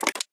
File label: candy cannon; Candy Crush Friends